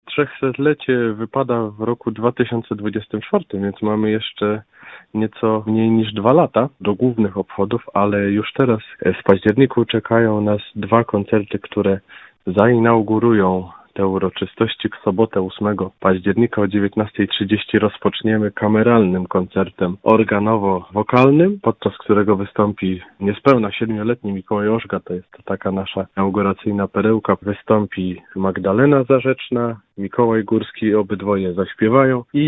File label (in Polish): koncert organy; wolow; 300 lecia kosciola sw karola boromeusza w wolowie